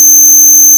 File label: phase distortion table